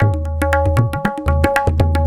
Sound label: Tabla; Sonic Foundry (Sony Creative Software); World Pop